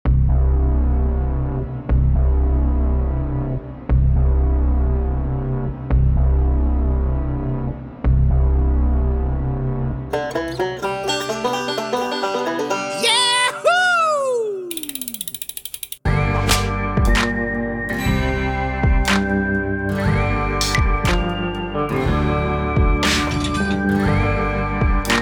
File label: Western Loop